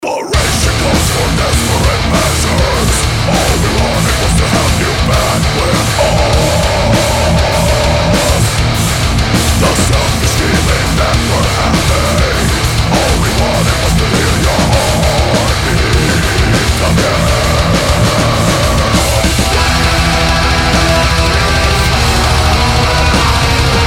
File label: MIX RECTO 60W; mesa